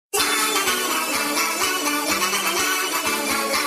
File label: xmas sms